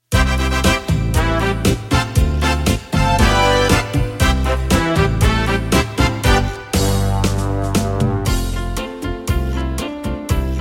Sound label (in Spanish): son instr